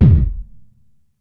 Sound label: Gated Kick, Gated Reverb; Univerb; musicradar